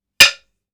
STICK HIT WILD; Wooden Staffs and Sword Fight; The AudioVille

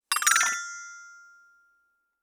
Xylophone Toy Stick Gliss Up; Mechanical Wave - Toys

